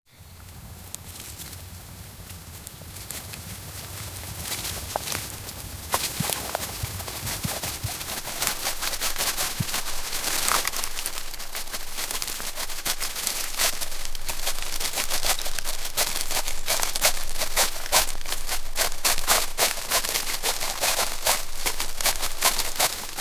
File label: a deer running in